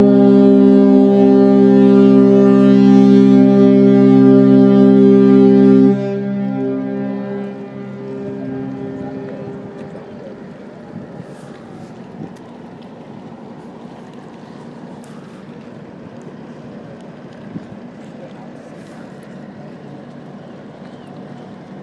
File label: horn blast; silver whisper